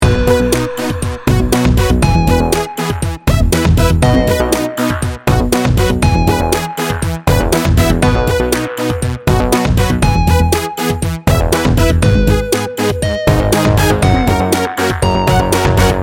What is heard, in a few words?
synth pop party loop